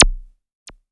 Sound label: bleep, Korg Electribe ER-1; Kit 15